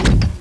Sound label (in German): Tuerschliessen; RPG Maker; Wartools v1.14